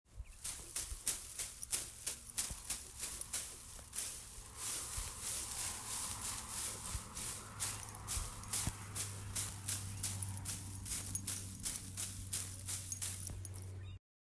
Running Through Field

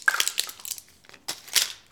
Taking A Pill

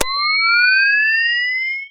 Night Vision

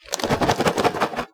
Shake Popcorn Bag

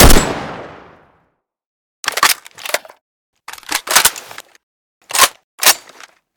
Assault Rifle Manipulation

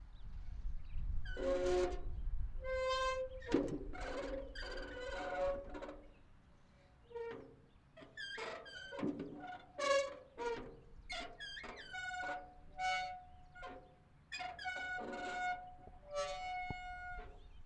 Seesaw Screeching

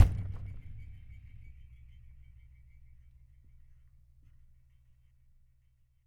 Hit Low

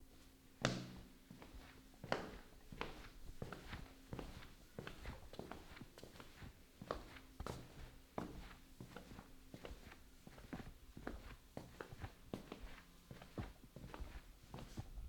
Casual Indoor Walking

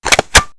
Office hole puncher

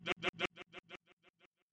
Odd Vocal

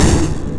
Crushed

